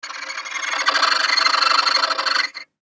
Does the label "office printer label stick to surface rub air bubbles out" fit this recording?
No